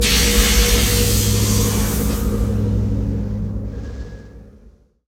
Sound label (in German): STROM FX; Best Service; Advanced Mega Effects